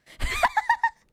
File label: Laugh; IRyS